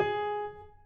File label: G#5